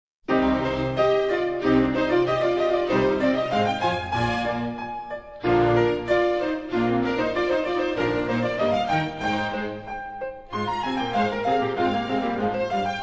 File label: Bach Piano Concertos